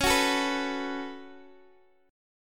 C sharp 7th Suspended 4th Sharp 5th, Guitar, DADGAD; down; chord shape